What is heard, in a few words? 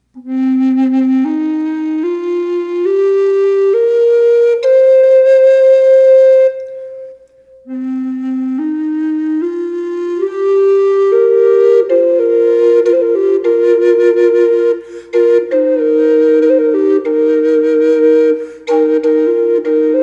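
reverb, flute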